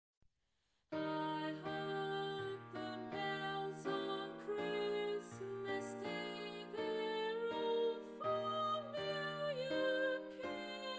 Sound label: Bass